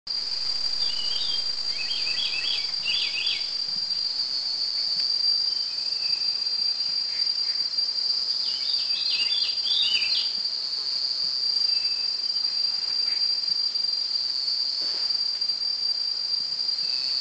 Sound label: Puff-backed Bulbul